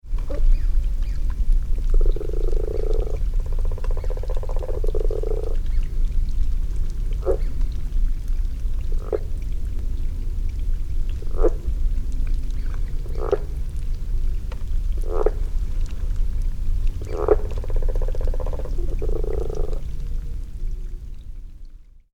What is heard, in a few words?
Rana chiricahuensis